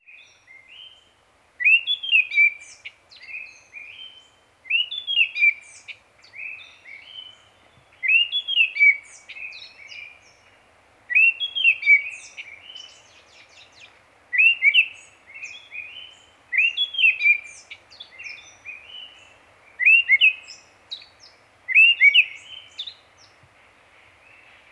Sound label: Abyssinian Ground Thrush